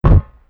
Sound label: walk metal